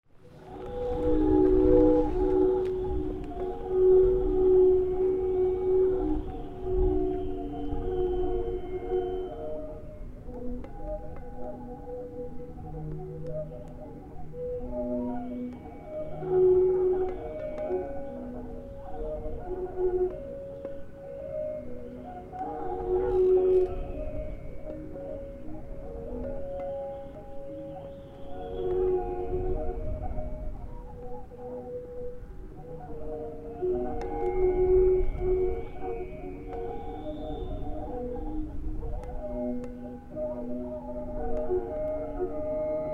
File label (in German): nordwest-wind; zoom